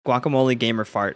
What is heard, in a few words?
fart; guacamole gamer